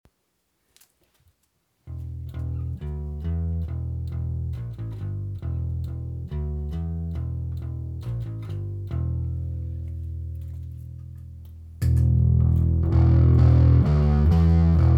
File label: Krampus P Pickup, guitar